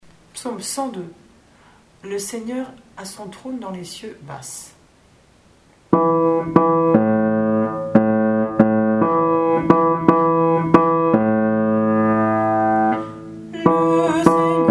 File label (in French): Psaume 102 Le Seigneur a son trône dans les cieux BASSE